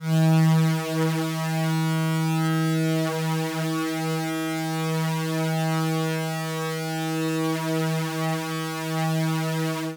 phase

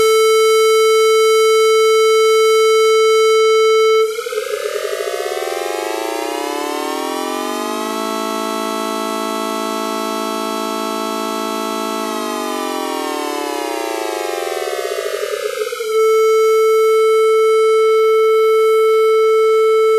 sqr 440

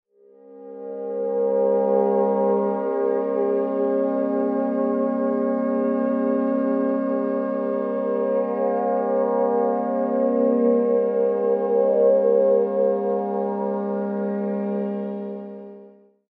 pad

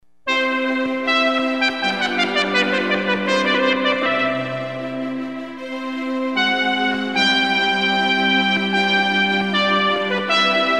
440 trumpet

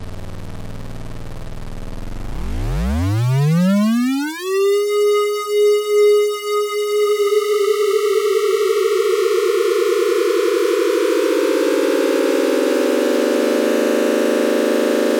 cloud

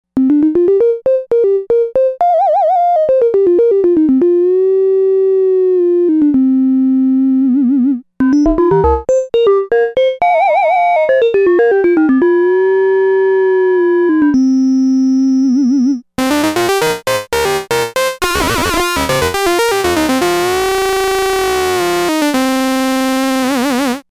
linear fm

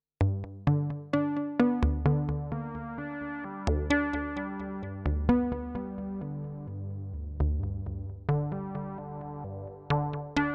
mux

